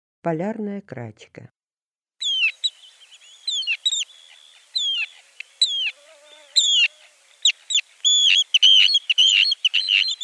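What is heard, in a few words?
Arctic tern voice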